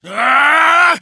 melee charge, voiceovers; english

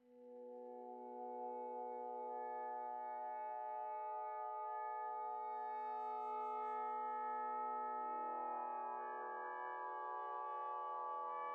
music interactive; World of Tanks

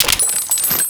anaconda reload clipout

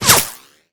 Projectile Sounds; Red Orchestra Ostfront 41-45

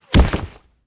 cloth bullet impact; Rust

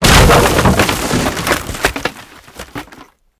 wood mid; tanks; War Thunder

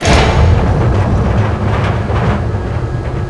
tutorial door start

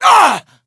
pain

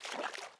drop water; Rust

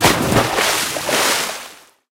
torpedo splash; War Thunder

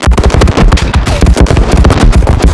plane; World of Tanks

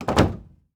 door double wood close end; Rust

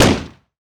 medium far distant, weapons; Modern Warfare

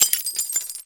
physics glass shards default

